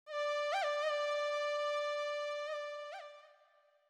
Moog, Hip Hop Exotica, 100 Dmin; Apple Loops; Big Fish Audio

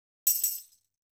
Tambourine; Hip Hop; Apple Loops; Big Fish Audio

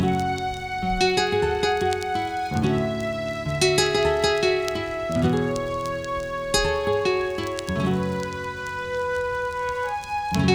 Full no vox, bpm92; hiphop; StudioLinkedVST Vinyl Touch; Progression 09

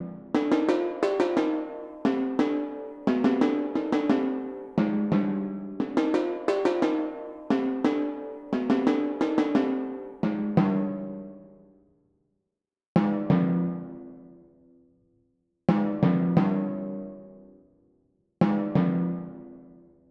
Timpanis; 088 Gmin

